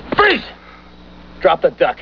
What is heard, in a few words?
duck